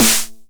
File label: 909 SD Tape Sat